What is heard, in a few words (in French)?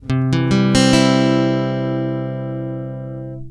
accord do majeur lent, l’accord de Do Majeur en vitesse ralentie; guitare